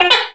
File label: start